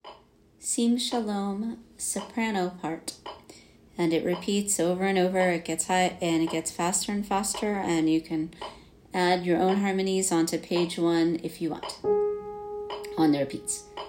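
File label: Sim Shalom Soprano; Sim Shalom - Shifman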